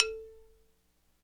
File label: XYLO, Afro Xylophn